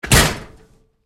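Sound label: Door Locker Impact Close Up Hard, Metal Door; Doors; BigRoomSound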